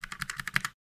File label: Logitech keyboard typing